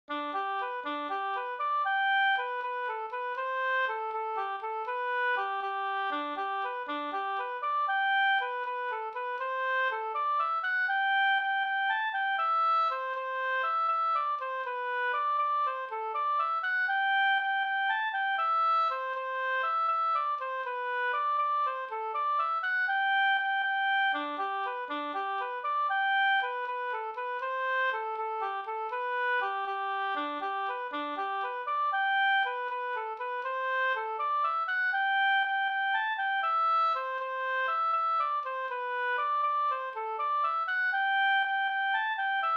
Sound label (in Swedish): brudmarsch; fr Himmerland